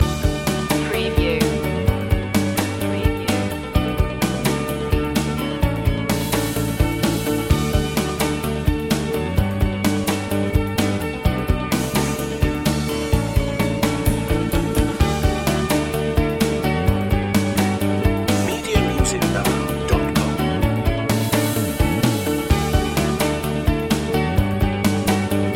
corporate ventures loop

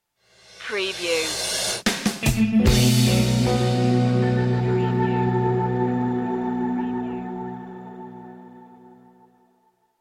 explorations stinger

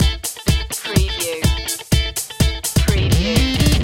disco funk loop

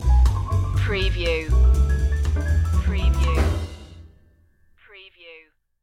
swing stinger

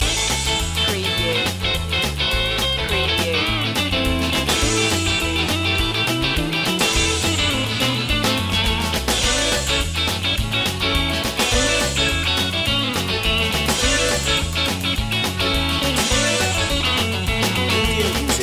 alligator stomp loop

